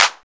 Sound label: PRC OPEN, Claps&Snaps; Northstar - Drumscapes Roland; Bonus Perc